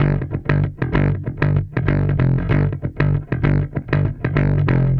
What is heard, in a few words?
Bass